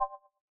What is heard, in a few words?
terminal upgrade